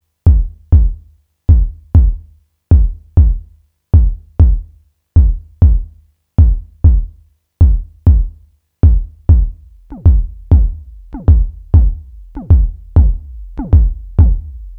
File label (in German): Tonale Percussion